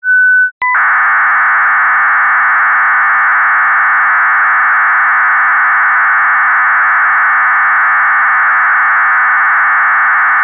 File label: test bpsk1000, moon bounce